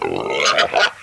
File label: pig idle